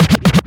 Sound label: Vinyl FX and Scratches, FX Scratch